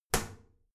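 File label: toilet lid wall open heavy, bathroom; haus-garten; badezimmer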